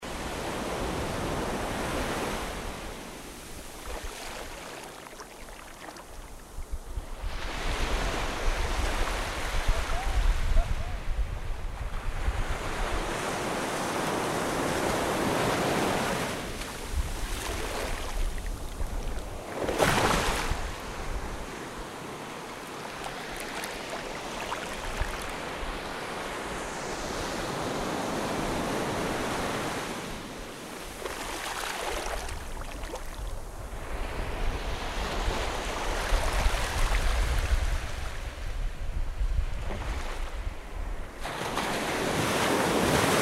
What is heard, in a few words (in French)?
ocean Pacifique